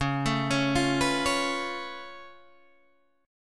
C sharp Augmented 7th C sharp down Guitar Open D, chord